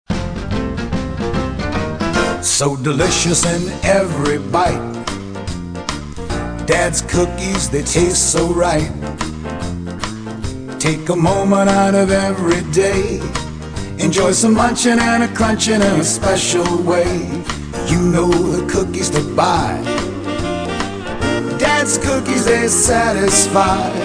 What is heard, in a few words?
Jingle